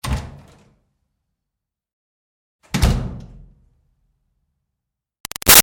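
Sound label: Door Wood Church Basement Room Open Close Hard; Miscellaneous Doors; BigRoomSound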